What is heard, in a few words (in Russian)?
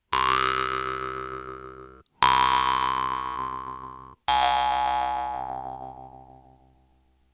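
harp vibration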